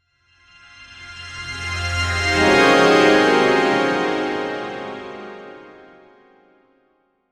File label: a divine overwhelming